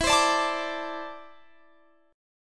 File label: E 7th Suspended 4th Sharp 5th, down Guitar Drop D